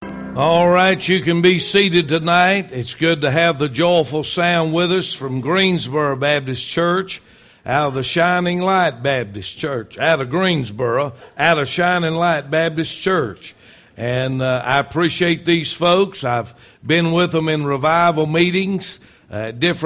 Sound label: joyful sound intro